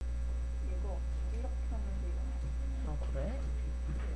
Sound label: woodcarving